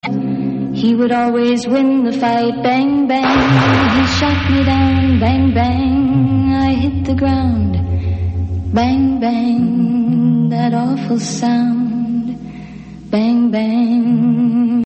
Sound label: bang; css; skyesports